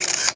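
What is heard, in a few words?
Cannon Shoot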